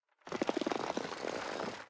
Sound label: reel spin start; goldentavern